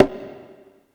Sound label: Conga H Mute, Yamaha PSR E305